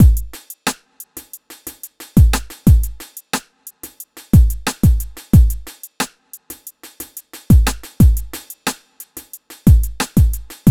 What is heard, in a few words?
Beat, Hip-Hop